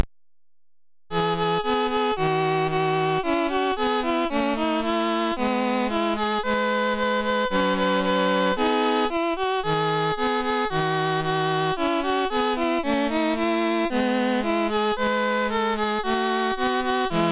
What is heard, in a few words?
waltz